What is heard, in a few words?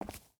footstep hide concrete walk; Rust